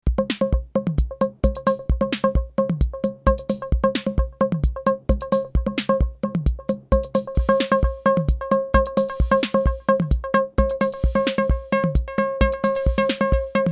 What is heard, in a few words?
Micromoog TR-606